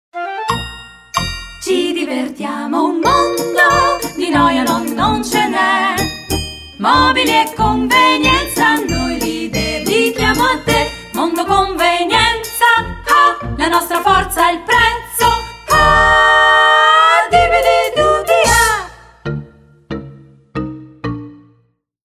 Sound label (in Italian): Jingle Istituzionale